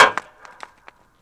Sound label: Hockey Puck Goal Post Hit; Sports & Recreation